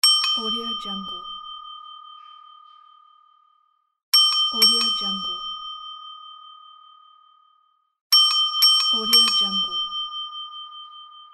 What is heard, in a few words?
service bell; davidbeardmusicproduction